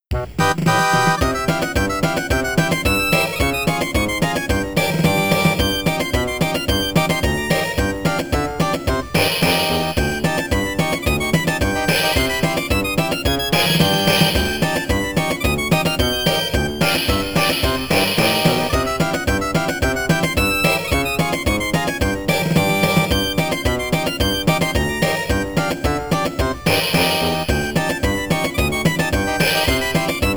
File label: Polka